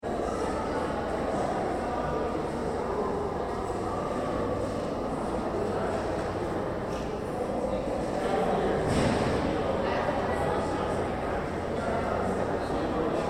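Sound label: Crowd Women's Conference Lobby Far; Bg Doors; Crowds (Sound Effects:Backgrounds); Big Room Sound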